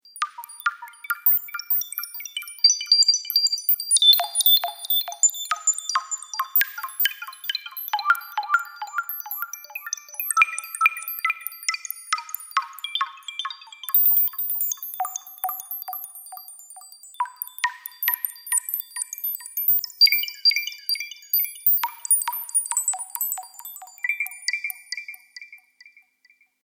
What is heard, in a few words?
Abstract water drops.; Strange Tones; Sound Design; Abstract Rain